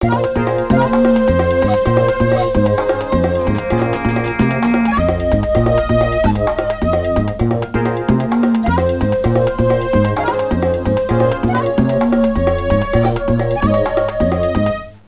suonerie contemporanea; musica contemporary

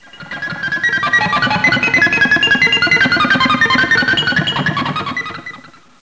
suonerie musica rock

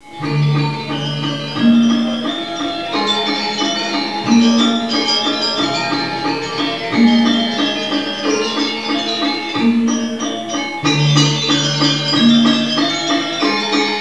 suonerie musica ritmica

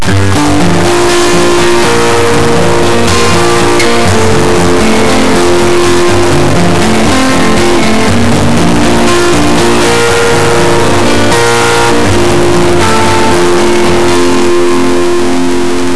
musica newage

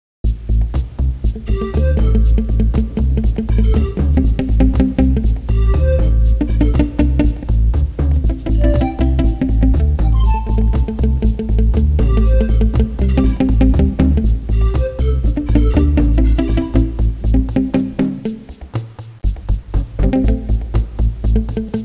suonerie musica ritmica

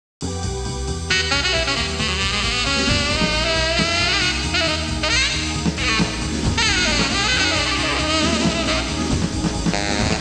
suonerie musica jazz

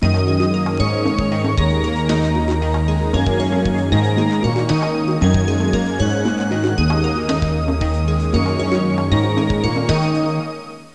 suonerie contemporanea; musica contemporary

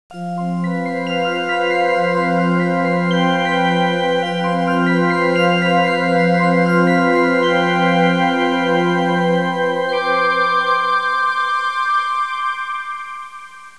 audio suonerie musica mistica

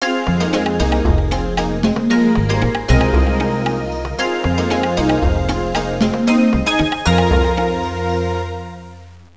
suonerie contemporanea; musica contemporary

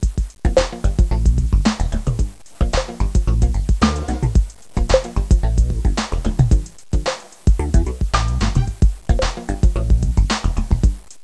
suonerie musica jazz

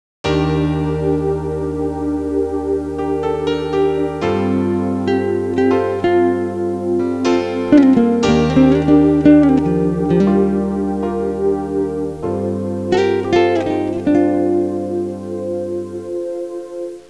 suonerie musica jazz

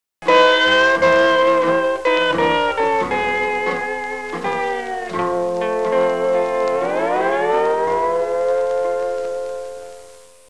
suonerie musica hawaiian

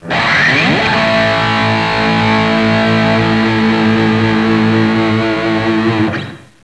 suonerie musica rock

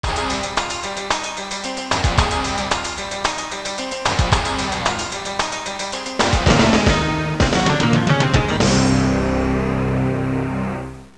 suonerie musica rock

